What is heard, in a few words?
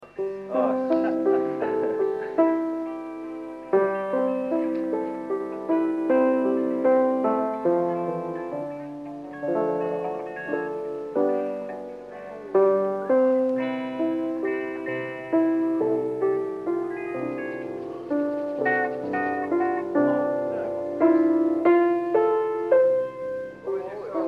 piano instrumental